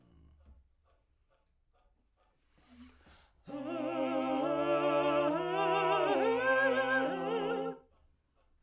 Backs SCHOEPS

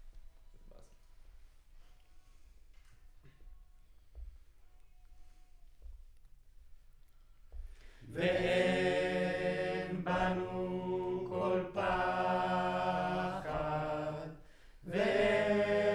Center u47, Choir

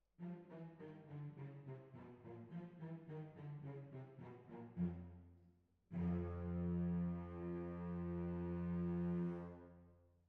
Cbasses

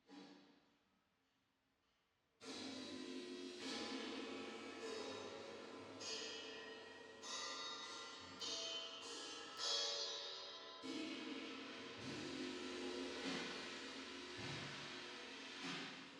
Drums Verb

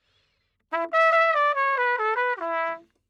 Trumpet

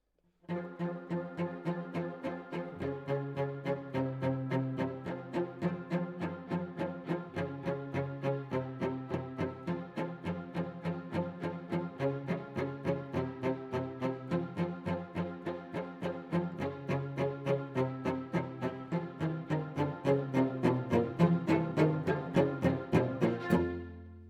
Cello Solo

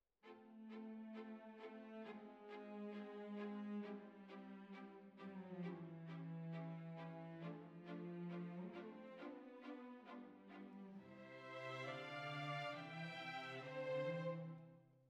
Violins Over

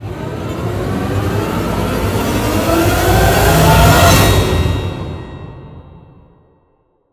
RISE

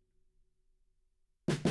Room A

